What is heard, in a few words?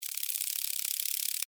Insect Wings Fly, Steady, Bug